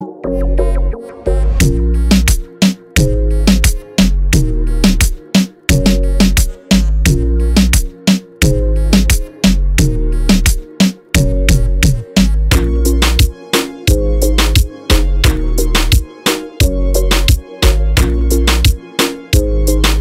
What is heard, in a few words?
REGGAETON; jingles